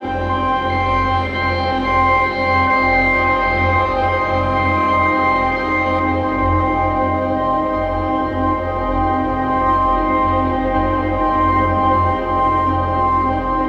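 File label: pad loop dramatic 150 F minor; Virtual Riot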